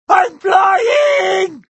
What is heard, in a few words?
jump; mid flight